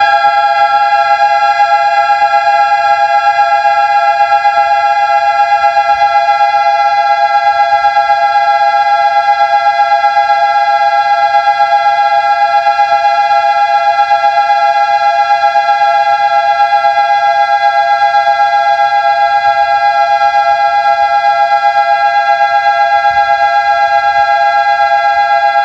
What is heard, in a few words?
Airhorn; lightning vs tornado alarms at texas a&m commerce